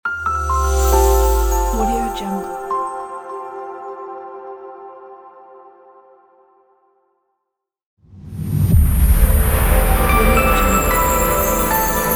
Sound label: logo reveal; logo reveal pack; kirillluzin